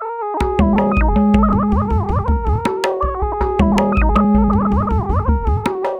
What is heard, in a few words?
TABLAS; Zero-G; Chemical Beats